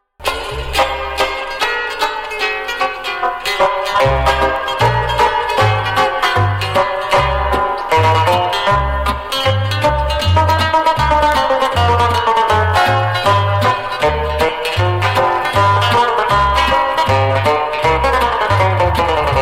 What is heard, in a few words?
perry fiddle, 4/4 time on the plectrum banjo